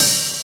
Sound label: open hat f key; drum single hits one shots